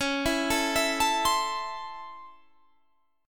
C sharp Minor Major 7th Sharp 5th C sharp Guitar Standard; C#mM7#5 arpeggiated; 21,19,19,21,22,20; JGuitar